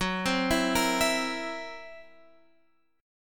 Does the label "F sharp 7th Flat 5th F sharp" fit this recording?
Yes